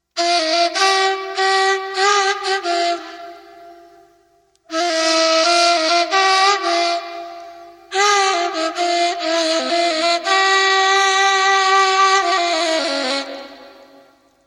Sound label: sad reed for audio mourning ceremony